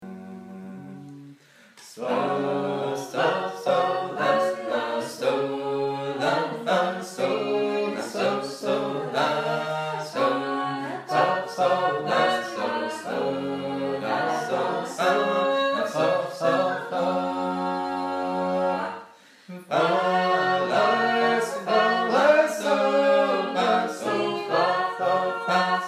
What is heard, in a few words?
small group, sacred harp; Holy City